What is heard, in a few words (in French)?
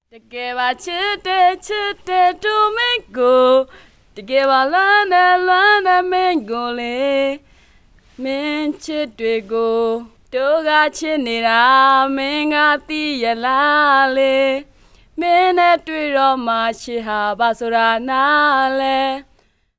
Chant pop par une jeune femme